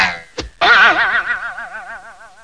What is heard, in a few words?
Bow arrow comic; How Would You Survive (1995); Grolier